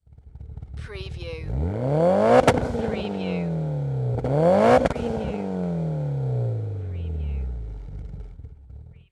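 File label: MITSUBISHI EVOLUTION EXHAUST DOUBLE REV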